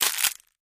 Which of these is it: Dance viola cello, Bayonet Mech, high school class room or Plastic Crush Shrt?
Plastic Crush Shrt